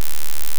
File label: DC offset Wave D2